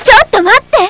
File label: pain; quake2; hottie